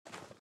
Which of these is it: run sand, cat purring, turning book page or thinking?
run sand